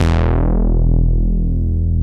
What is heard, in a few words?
MINIMOOG; Greytsounds VOL-1